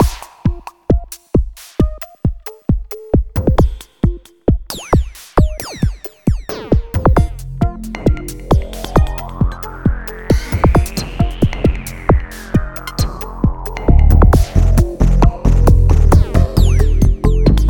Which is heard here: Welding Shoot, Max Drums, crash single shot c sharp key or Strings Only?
Max Drums